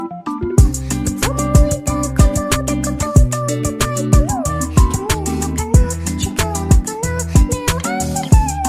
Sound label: anime chill